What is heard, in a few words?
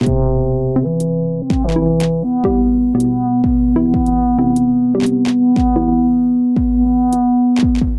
loop lead oboe drum; lead2; Vilmonic